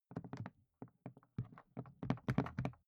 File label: briefcase plastic handle handling; industrie wirtschaft; bueroarbeit